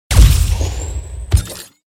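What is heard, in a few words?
rifle shoot; pixel gun 3d; anti champion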